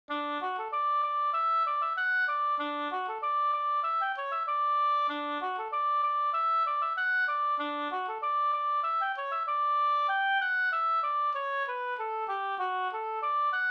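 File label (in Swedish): anglas fr Husby-Oppunda Södermanland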